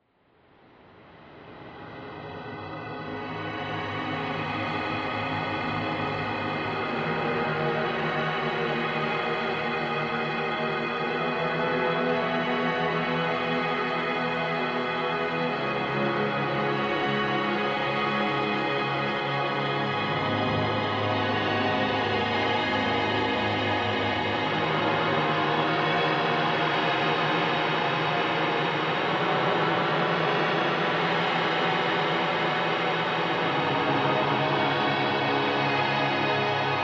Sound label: granular